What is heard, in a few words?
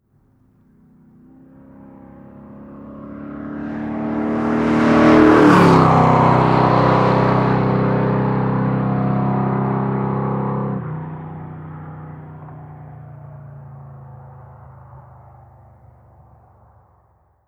Muscle Car Fast By 125MPH; Vehicles